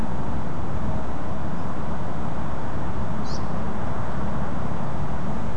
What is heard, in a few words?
unidentified upward call 4-7.5kHz, nocturnal flight call; nocturnal flight call project in beijing autumn 2021